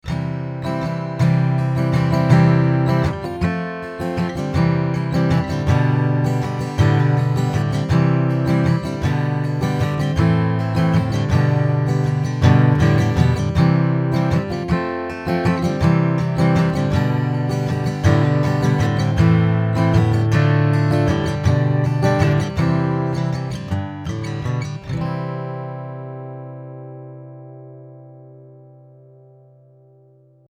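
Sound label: Acoustic Guitar HiZ; RME UFX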